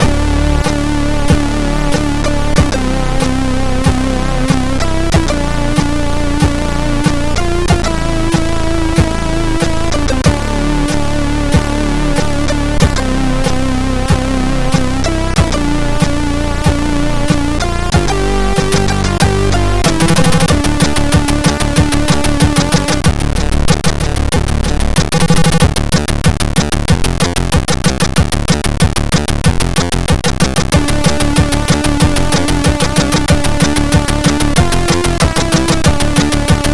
c64 hiscore; turrican

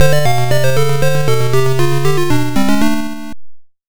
arcade game over; kung fu master

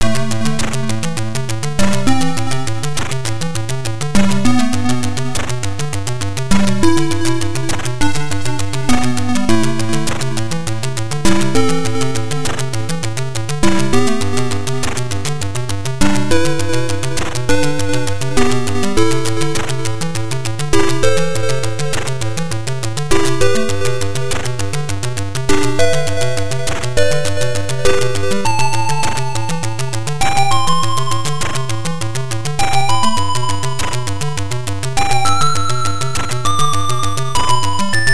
atari end level; dogs of war

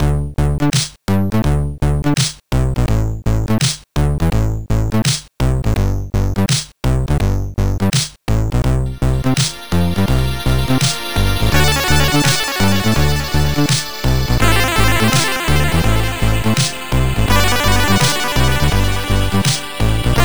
amiga hiscore; led storm

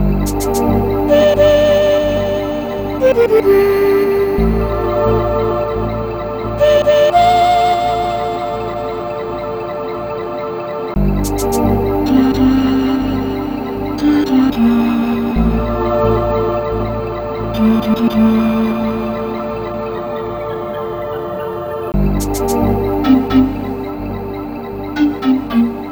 amiga game over; shadow of the beast

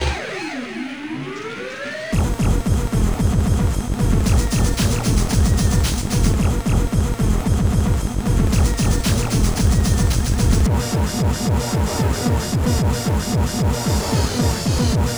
arcade; ridge racer

